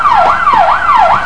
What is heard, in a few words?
siren; vehicle mod